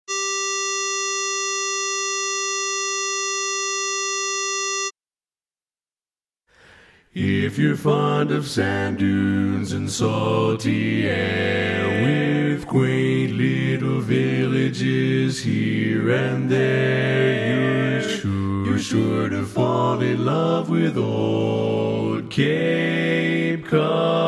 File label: Bass